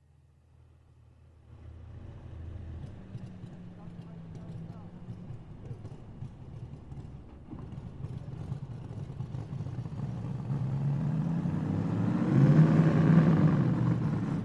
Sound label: BRM push start